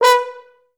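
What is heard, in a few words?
FHS2 staccato B3, Advanced Orchestra; Best Service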